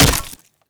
crowbar hit, impacts flesh; weapons

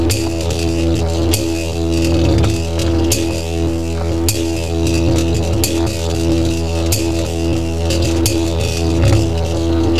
ambience electrical; mesa facility

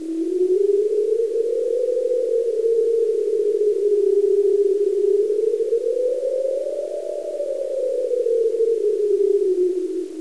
spooky, ambience

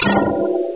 glang, ambience

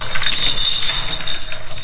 chain

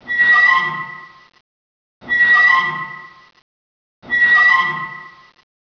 pipe; rebar

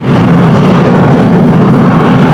jetpack loop; player

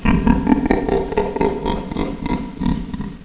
monster die

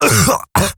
cough; player

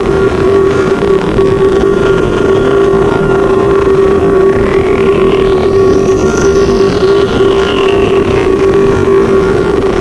cave tele

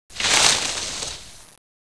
brush movement; ambience

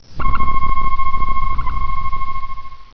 radar dish loop; ambience

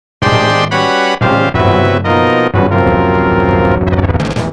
boss music intro; zelda

